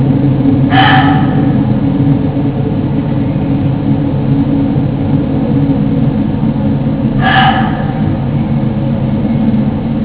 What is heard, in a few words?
Muntjac Barking